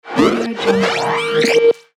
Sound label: scifi transition